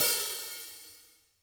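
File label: hi-hat open; Sonic Reality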